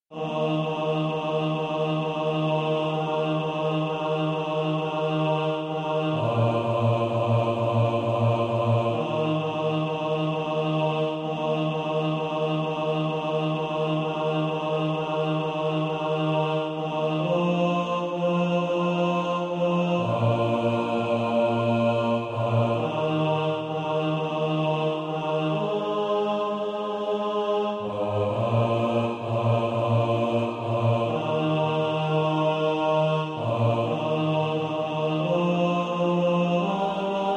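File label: bas, practice music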